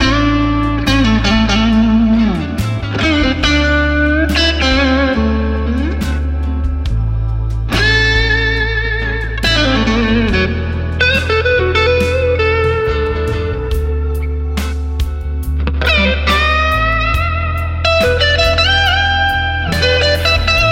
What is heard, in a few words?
Electric Blues w Guitar Solo 70 bpm